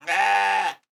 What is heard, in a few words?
goat; battle